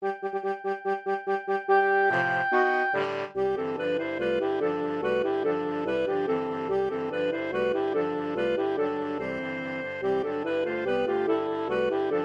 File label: Gallop Suite for Saxophones; Stravinsky